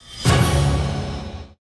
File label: Scatter Drop; slots; sRoma